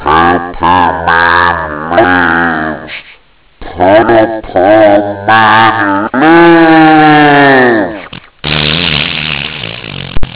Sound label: fart sounds